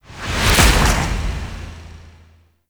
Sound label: Land; Emissary Season Two; Zeal Pre Intro; Cinematics